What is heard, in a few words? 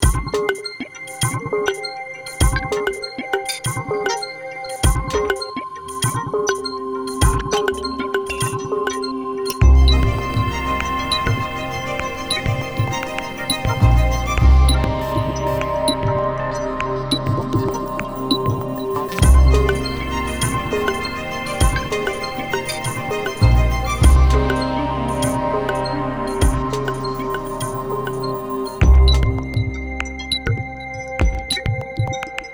Submenu Guit; xpand rally xtreme